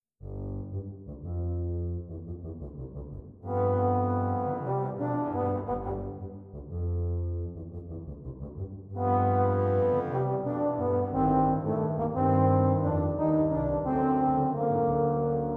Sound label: Bari Bassi; Sibelius Recording